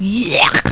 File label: yech